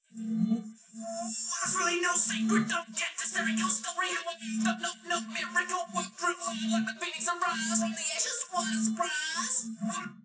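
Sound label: with drums, 380ms 4cm; SiSEC11; underdetermined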